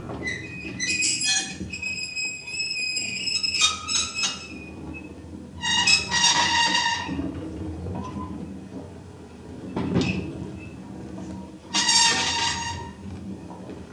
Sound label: metal move